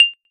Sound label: blink other